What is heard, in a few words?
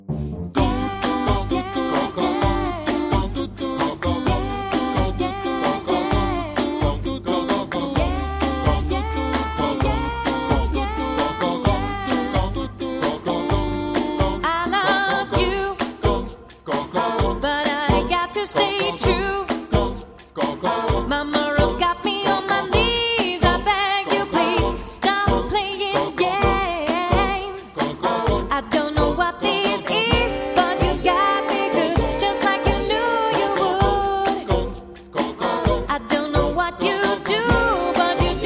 Mercy SATB